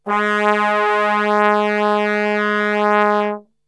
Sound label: G#1 Brass Super Section; Best Service; TRMBSTRGHT; 90 s Sample CDs